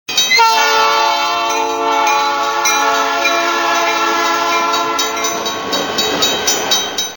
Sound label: train crossing